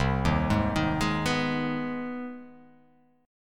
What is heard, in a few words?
C Minor Major 7th Sharp 5th C; CmM7#5 arpeggiated; JGuitar